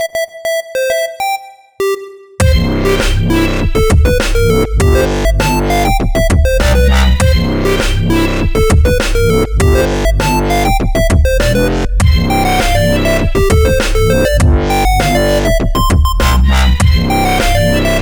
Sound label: dubstep; mario dubstep